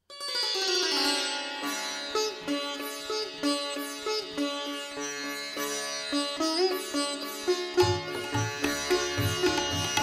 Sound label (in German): Klingelton Sitar